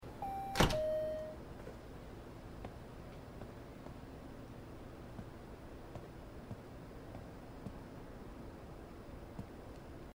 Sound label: gas station hintergrund; Life is Strange 2; gamesoundopedia